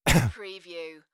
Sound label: Male Cough; beanstalk audio